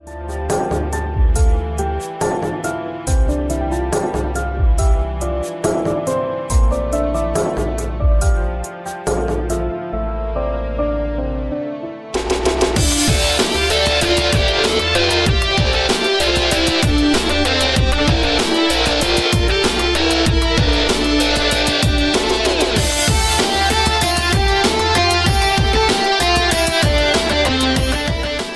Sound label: emotional remix ringtone